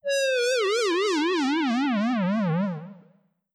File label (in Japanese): kiga nukeru